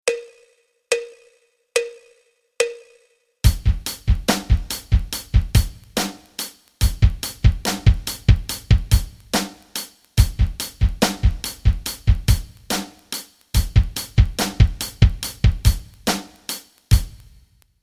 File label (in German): rock rhythmen